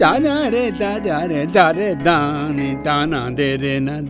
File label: Classical, Vocals Male; Sonic Foundry (Sony Creative Software); World Pop